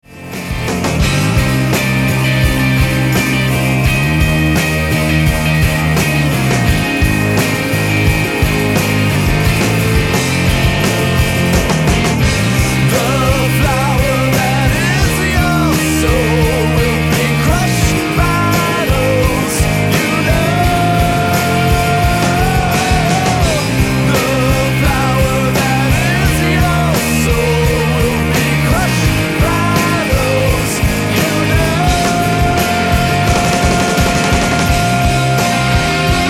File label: Upbeat